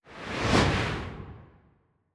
Vampire Run

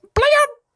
Little Attack

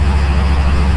Tank jet

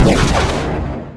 Explosion

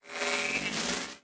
Bolt Charge; Legend of Keepers; Futa Bolt Charge

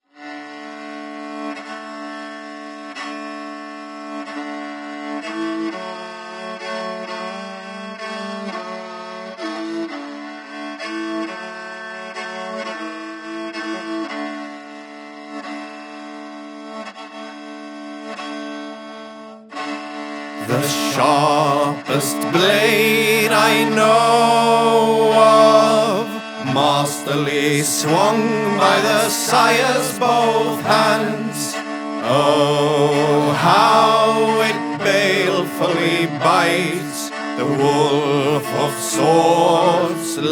vocal+chorus+tagelharpa

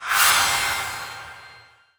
Jingle Level up

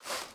Sittable Office Chair Stand; viewfinder

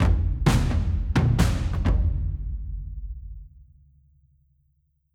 Loop Win A

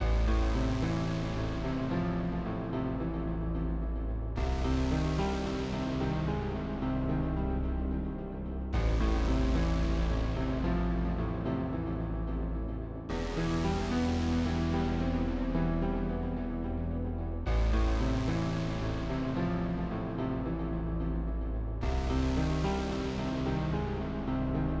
Stingers failure Loop; Teenage Mutant Ninja Turtles: Splintered Fate

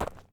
Sand step

